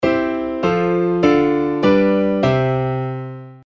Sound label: Tonic Chord; Sequenz mit einem regulären Ende (Tonika-Akkord)